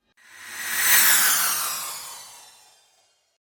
Magical Impact Accent

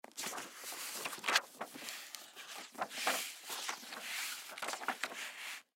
Sketchbook Large, Sketchbook Page Multiple Turn; Foley; Books; Offices; Blastwave

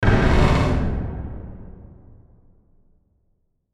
Hit Distorted Reverb Wash; Distortion; Blastwave

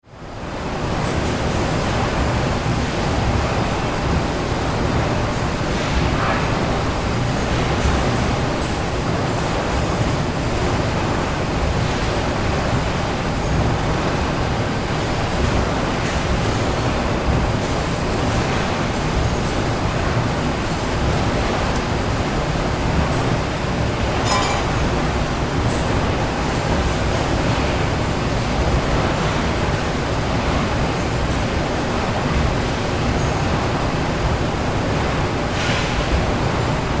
Ambience Factory Heavy Activity Industry; Factories

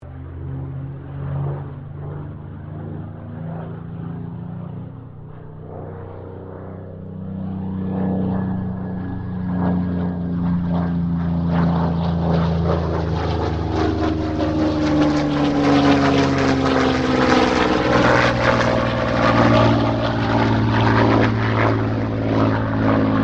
AIRCRAFT SUPERMARINE SPITFIRE MK-XVI PASS

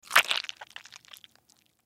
Eye Gouged Squish Poke Juicy; Household Sponge Squish Splatter Squeeze Water Drips; Horror; Blastwave

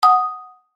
Multimedia Chime, Technology, Multimedia, Chime; Chimes; BlastWave

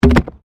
PLASTIC RUBBER PIPE; Material components for constructing more complex sounds; Plastic (Sound Effects:Materials)

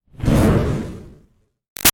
Fireball Whoosh Burst Huge Phasey; Whooshes; Sound Design

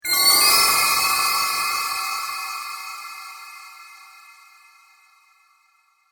Eerie Chimes Scary Suspense Dark Metallic; Horror; Scary Tones; BBFX

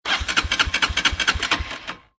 Motorcycle Dirt Bike Engine Turning Over; Auto, Car, Motorcycle, Motorbike: Motor turning over.

